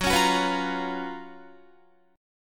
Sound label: Gb Major 11th Guitar; GbM11 strummed; JGuitar